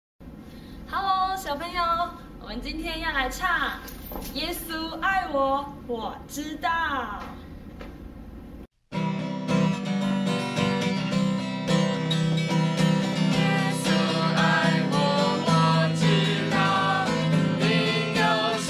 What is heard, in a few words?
Jesus Loves Me Chinese lyrics 耶稣爱我; Pinyin